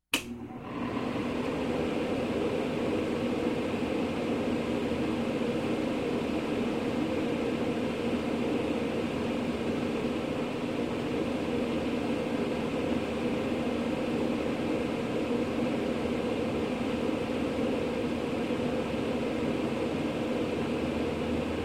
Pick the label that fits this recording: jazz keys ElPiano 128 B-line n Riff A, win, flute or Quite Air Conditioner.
Quite Air Conditioner